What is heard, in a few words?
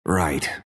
move pain; Monkey King